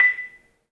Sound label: MARIMBA, B; 3D Sound; 3D Keys VOL-1